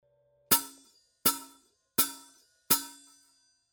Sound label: 14 I Mastersound HH foot chicks, cymbal